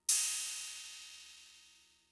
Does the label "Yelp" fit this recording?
No